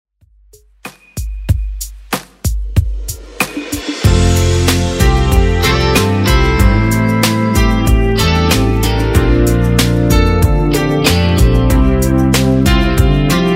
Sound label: 80s Redrum, 94 bpm